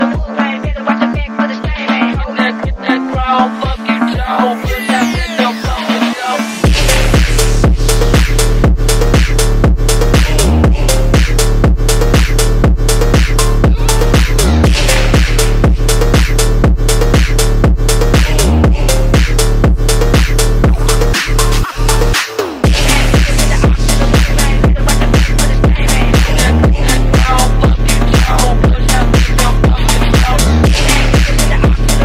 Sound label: Phonk